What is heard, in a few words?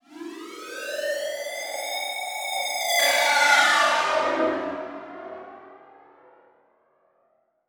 Title Transition Ascend; Production Element Title Transition